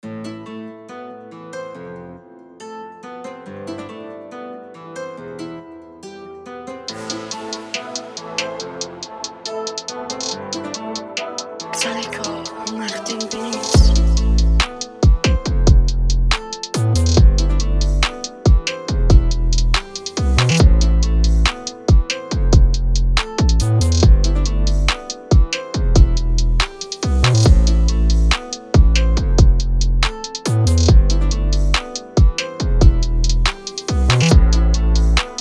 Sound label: waves 140 bpm